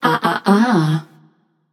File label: disagree passion; chaac